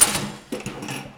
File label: Prepared Drums